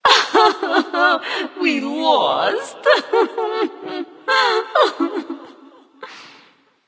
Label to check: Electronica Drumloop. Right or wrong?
Wrong